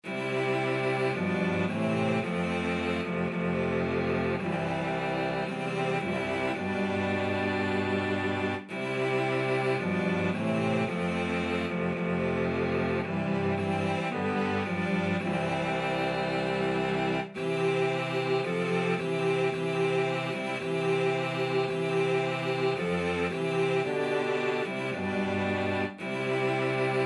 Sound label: cello quartet